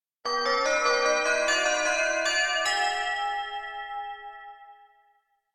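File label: electronic bell kakakakaan; anemoi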